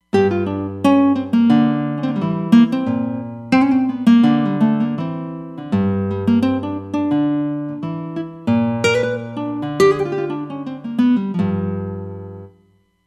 Nylon Gt, Roland Fantom XR

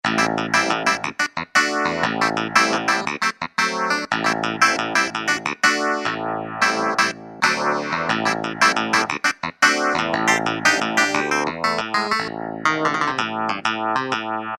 Phase Clav, Kurzweil K2000; KEYBOARD; Kurzweil K2000 Contemporary ROM; Contemporary ROM Programs and Demos diskette

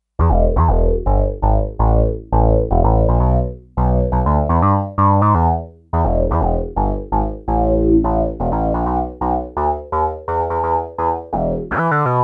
Synthbass unison, Sequential Circuits Six-Trak; Factory Programs

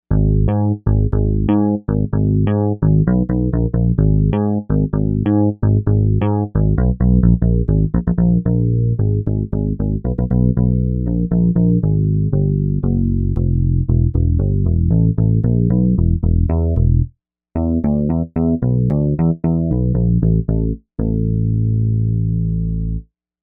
Pick Bass, Novation UltraNova; Factory Patches

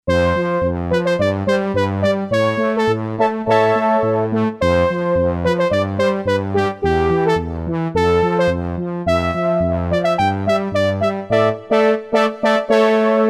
Synth Brass, Roland SC-880; Factory Tones

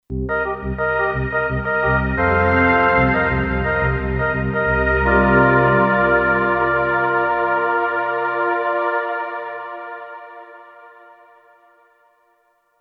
Resorgan, Kurzweil K2500X; Factory Programs

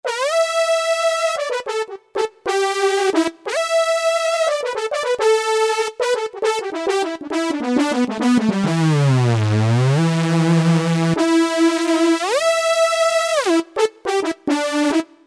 Syn Lead, Roland D-110; Factory Patches

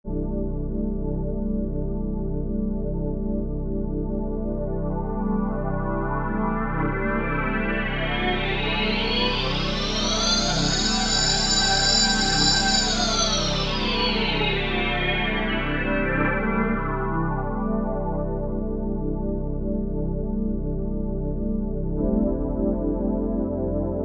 Analog Pad; synthesizer; Guess the synthesizer game

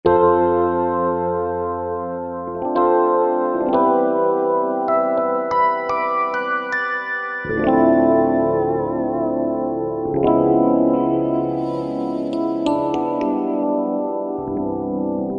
Ballad EP, Roland V-Synth; Factory Patches

